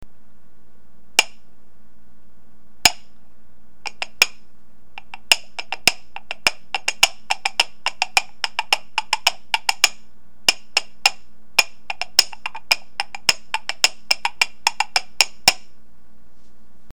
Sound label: horse